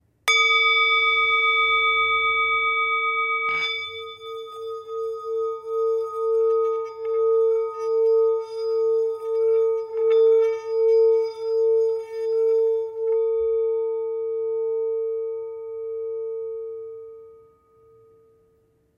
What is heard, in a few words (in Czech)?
tibetska misa; saga mala